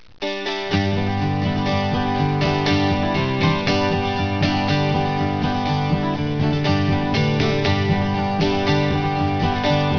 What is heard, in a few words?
Dulcimer